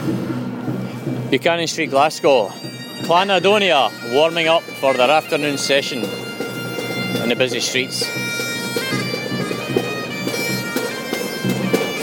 scots band in buchanan st glasgow music soundscape; scotsauthenticmusic